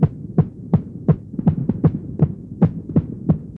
Battements de coeur

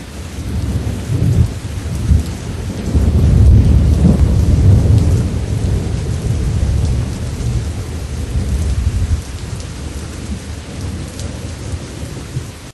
Orage roulement pluie forte; Orages

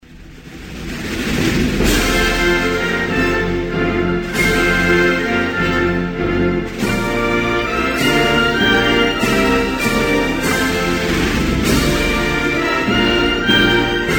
Armenie, Hymnes nationaux

